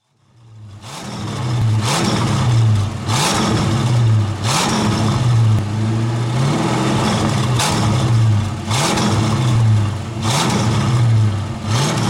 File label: Ford Mustang 289